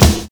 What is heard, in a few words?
BLUES; Univers Sons - Kitch 70s